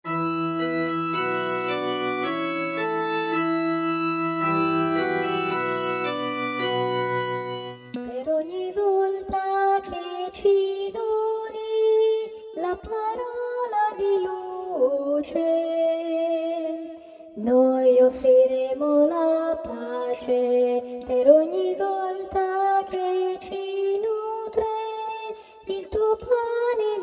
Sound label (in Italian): il seme del tuo campo soprani